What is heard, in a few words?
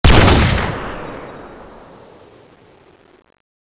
awp